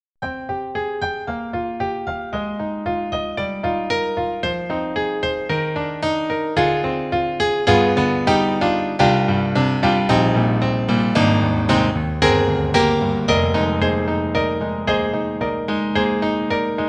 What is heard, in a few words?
SD-90 Classical Piano; EDIROL; Piano 2; Piano 2w